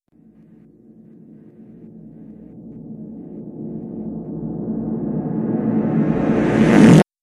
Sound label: traf with extra reverb